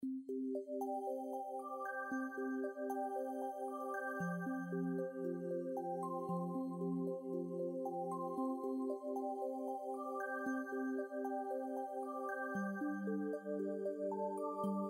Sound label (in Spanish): música; SALA E